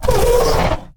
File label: hurt, she; combat; creatures; ryuchi; CosmicRage